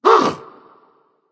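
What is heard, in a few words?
nonverbal surprised; smite; voicepack; merlin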